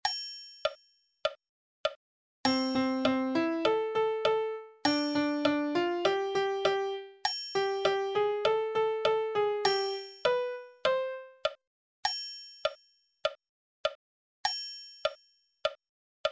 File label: music